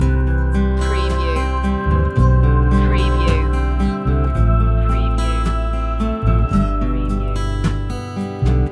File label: acoustic ocean loop